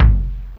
T tom, Fairlight CMI IIe Drums

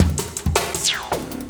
Loop, Drum & Bass, 160 BPM

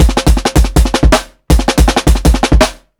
PICCOLO 32ND FILL, breaks, drums; Basement drums vol 2

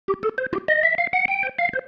Single Riff, Organ

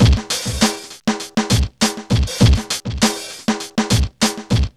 beat 100; Zero-G; Funky Elements

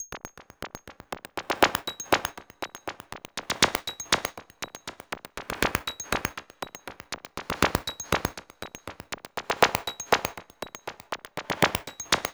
HIQ BEATS; Zero G; Malice In Wonderland

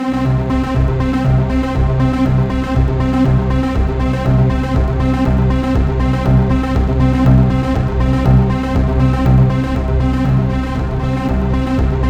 C3, SynthLine; 90 s Sample CDs; NiceBeats; SynthLine VOL-7

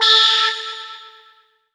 lead syn, Techno Trance

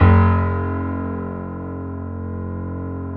EPM PIANO, KEYS, Synthesizers

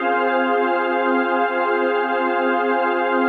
CHRD PAD; Best Service; XX Large Pads; Sample CDs

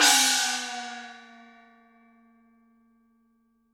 CYMB FX; Univers Sons - Raricussions

